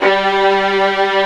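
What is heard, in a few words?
ARCO VIOLI, Tremolandi Violins; E-MU Producer Series Vol. 2 – More Studio Essentials; Composer; Tremolandi Vlns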